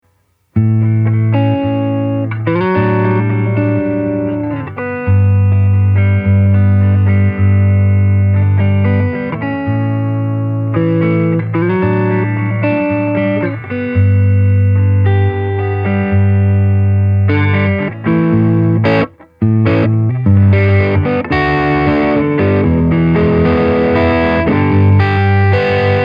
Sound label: eq noon drive; tc electronic